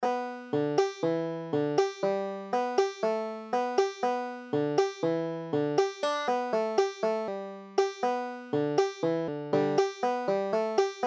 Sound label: banjo